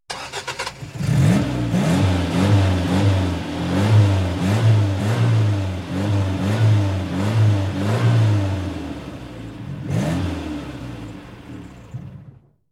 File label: Car Engine Start and Revving